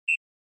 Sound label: SNES Soccer Whistle